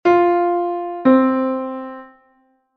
4ª Justa Descendente; intervalos musicales; ¿Qué intervalo suena?

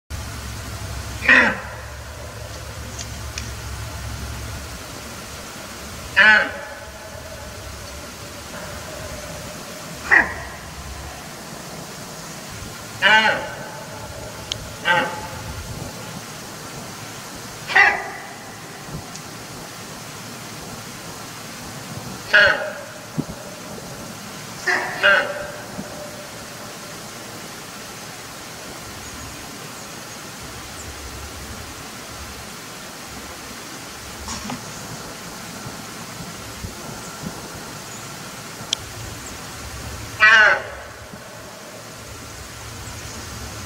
La Ladra De La Cierva; Venado gris de cola blanca; Lambayeque

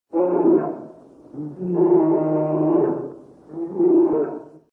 Oso; Oso de Anteojos; Lambayeque; su hábitat es casi exclusivamente en los bosques húmedos andinos

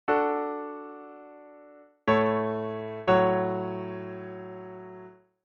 Rota V A Iii 5 D, cadencia; auditivo de cadencias autenticas plagales rotas y semicadencias; Identificar la cadencia

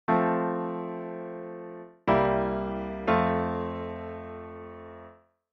V7 a I, F; auditivo de cadencias autenticas plagales rotas y semicadencias; Identifica la cadencia

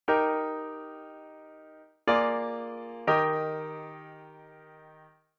V7 a I, Eb; auditivo de cadencias autenticas plagales rotas y semicadencias; Identifica la cadencia

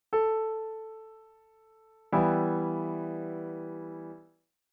Mi menor (1 sostenido)

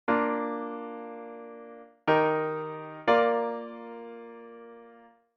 Cadencia Plagal Iv a I, Bb; auditivo de cadencias autenticas plagales rotas y semicadencias; Identifica la cadencia

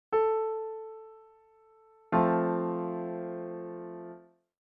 Fa Mayor (1 bemol)

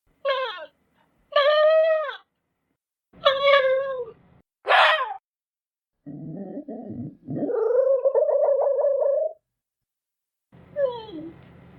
Sonido De Zorro; Zorro costeño; Lambayeque

